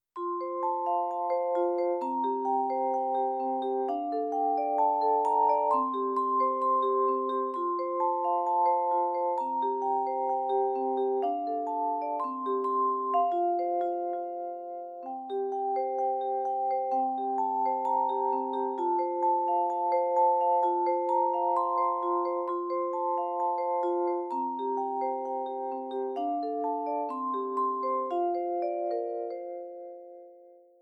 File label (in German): Hännschen klein